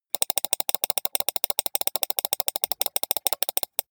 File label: computer mouse click sequence steady long